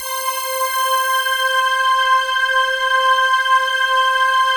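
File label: pulse; Masterbits; Vintage Voltage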